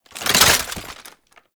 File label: Rocket Launcher Reload; Warframe; Weapons; Tenno; Lasrian